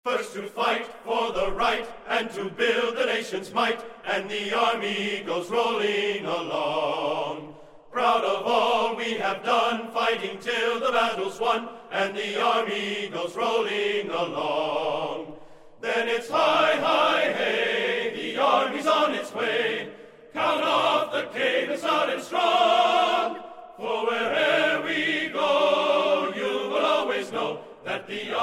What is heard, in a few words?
Army Song Chorus; values